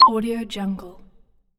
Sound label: old school game button sound